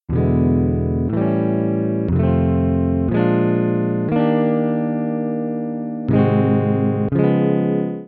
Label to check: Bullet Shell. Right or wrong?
Wrong